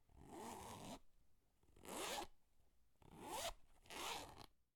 Zip Stereo

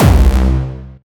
Distorted Reverbed Kick

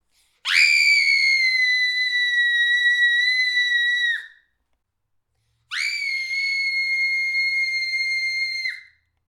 Child Screaming

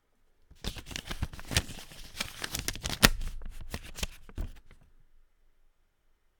Paper Money Sound

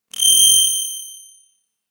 High Alien

